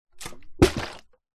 bottle flip plastic water bottle throw flip land

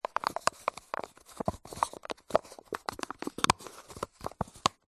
plastic cup empty handling

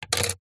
household spectacles glasses down on table

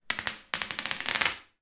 toy suction pad remove pull from bathtub

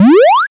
multimedia retro game jump

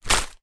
foley chef paper hat place down on surface

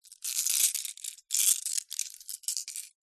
gemstones handling; zapsplat

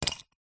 nature ice block small set down

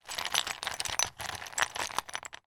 office person rummage pot of chalk sticks

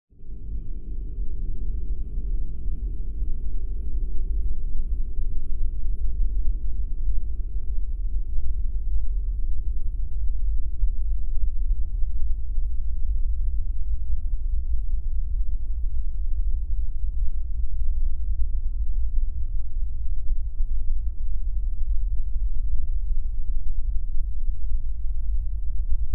sound design drone dark eerie lfe; zapsplat

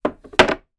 sport cricket bat down on wooden table

